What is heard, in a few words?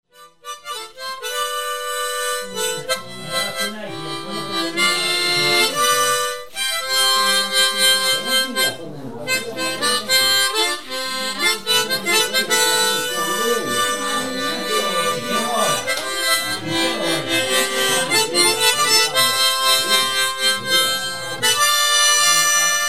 mouth organ sad